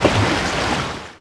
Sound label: Oars Water; Civ4 warlords